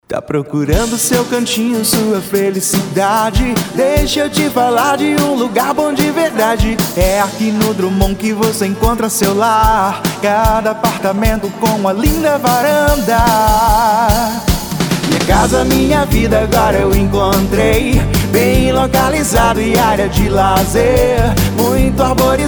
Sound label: Jingle